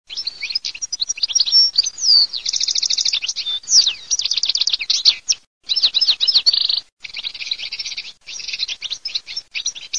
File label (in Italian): CARDEL NIDO